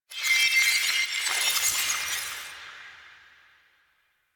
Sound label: Glass Ring Dissolve; DE; Warframe